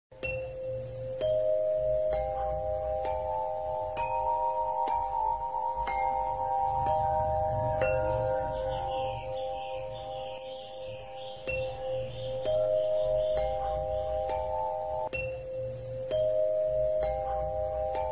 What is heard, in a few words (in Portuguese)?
Pentatonica Dorica